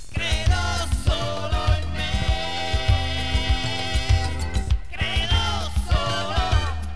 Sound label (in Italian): credo solo, pianoforte e voce